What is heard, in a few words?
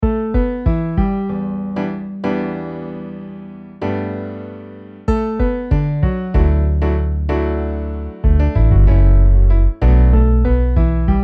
Piano Bass MIDI